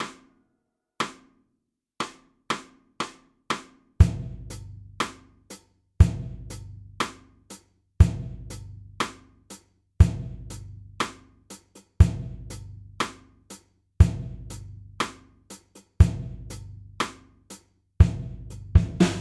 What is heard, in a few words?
Drums 120 BPM